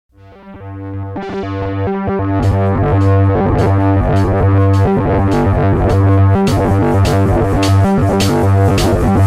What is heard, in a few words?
PICKUP; Marc Ecko's Getting Up: Contents Under Pressure; XBOX